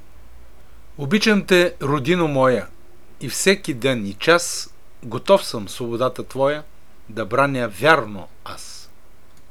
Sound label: kuplet